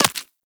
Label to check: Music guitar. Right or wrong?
Wrong